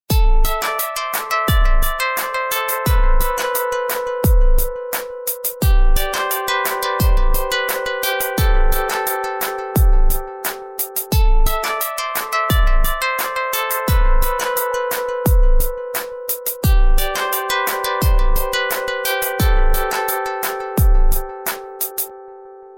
Romantic Music Ringtone Cool Instrumental Ringtone Soft Instrumental Ringtone; Ar Records